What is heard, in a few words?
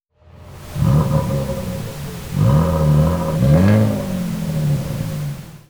Rev pink